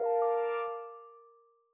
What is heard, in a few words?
Portal; iOS 17 Tones; Text Tones